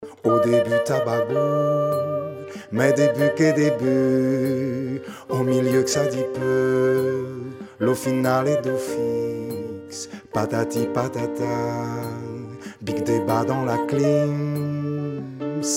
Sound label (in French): BASSE